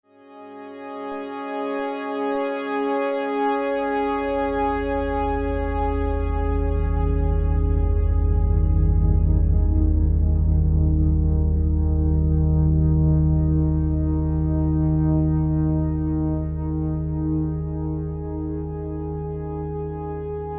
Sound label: FM Melodic; Beds